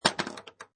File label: Pinball Sound Ball Sprung; Video Games